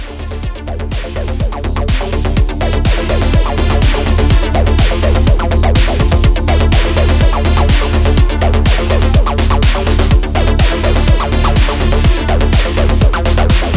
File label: Beats